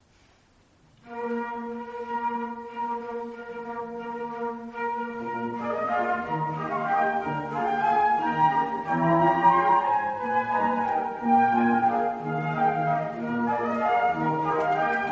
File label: concert, flute; 華麗な大円舞曲; arietta-flute